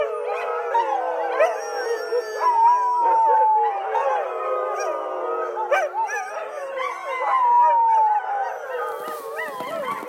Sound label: Howl, kennel